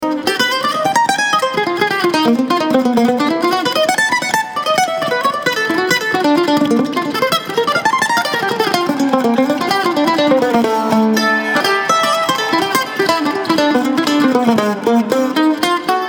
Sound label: Kentucky Mandolin